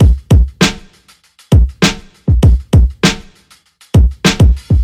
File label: Drum loops, Boombap